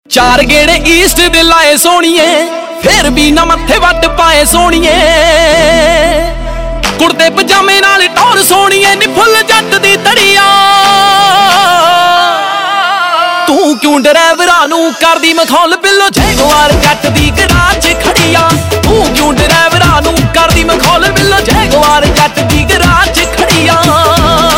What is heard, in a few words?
Ringtone, Punjabi